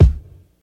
deep kick single shot c key, bass drum single shot tuned to the C note